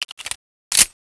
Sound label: fiveseven clip in; ghost ws; weapons